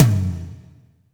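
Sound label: tom, real mega drums; Best Service; Real Mega Drums VOL-1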